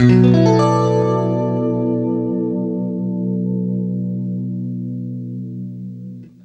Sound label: CLEAN BM9, Guitar; East West; Steve Stevens Guitar Samples Collection